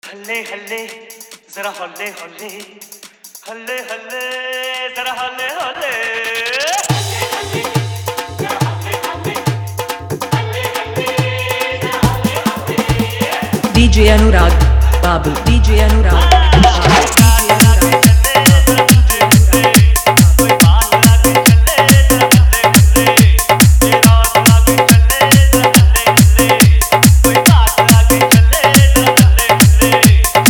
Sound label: Hindi Full Dhollki Bass Mix